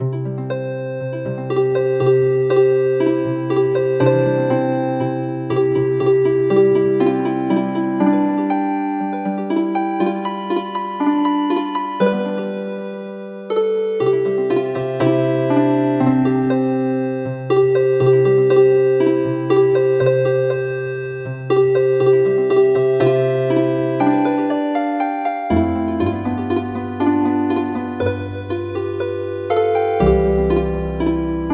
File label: Healin Harp; Clap hands with the ladybugs